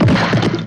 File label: rocks enveloped; Despair 1.6